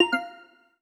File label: confirmation negative; steam; steamui